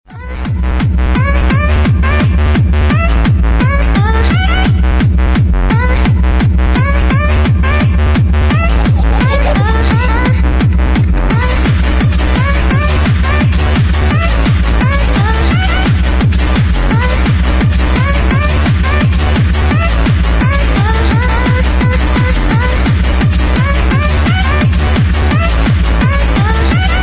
Aah Ooh Ah

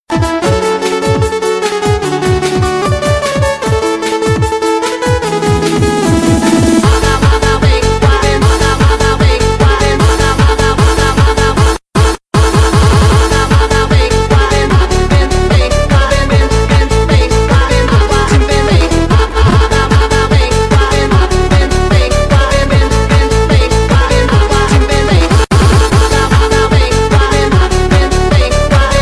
Dance Remix

